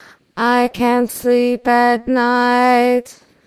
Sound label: singing